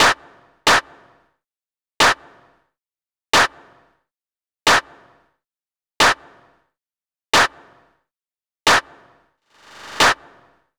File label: Nasty Clap; Zero-G Smoke